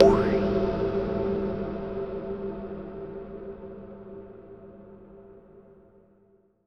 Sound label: TECHNO 140; Techno2